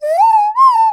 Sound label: Nose Flute; shakuhachi; SonicPi